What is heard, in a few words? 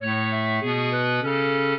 minuet, clarinet; Mozart